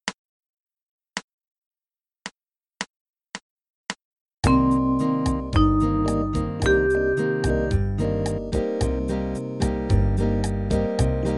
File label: Min7 Root